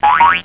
Sound of a spring springing (friendly notification); End of text edit field/area